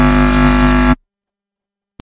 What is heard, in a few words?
wrong buzzer